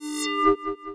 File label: Window UnMaximize; KDE; knotify